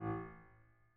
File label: harm A1, Grand Piano; bitKlavier Grand; Piano Bar